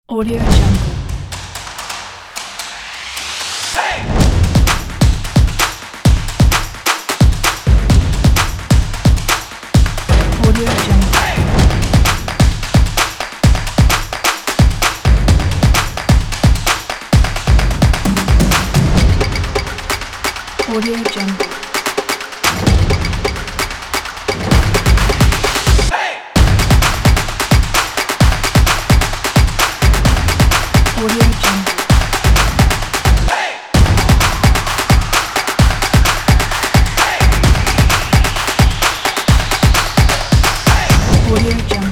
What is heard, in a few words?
percussion